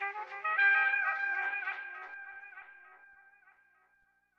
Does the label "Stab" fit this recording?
Yes